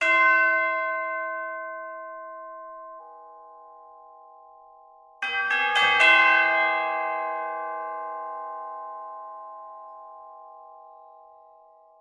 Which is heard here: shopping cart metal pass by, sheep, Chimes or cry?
Chimes